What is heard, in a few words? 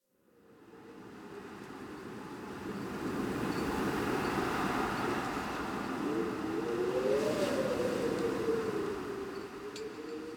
Exterior Winter Wind One Off; Warframe; Ambience; Nineteen Ninety Nine; DE